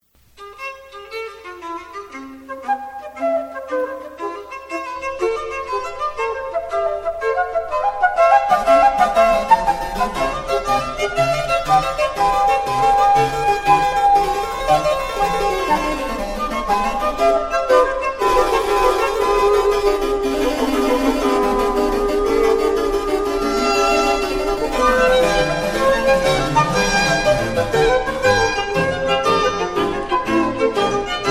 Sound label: compound meter